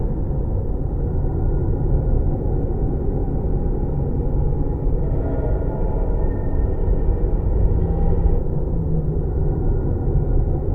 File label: arc keep, ambience